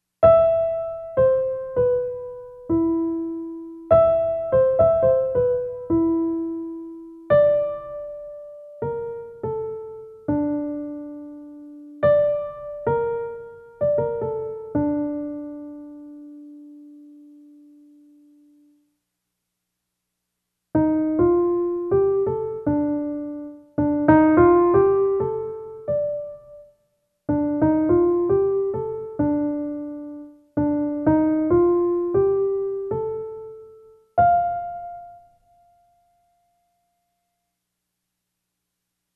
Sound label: duet